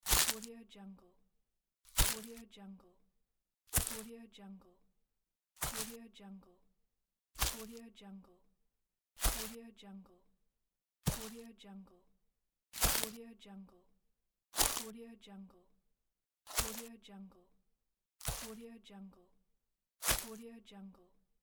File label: single footstep running on leaves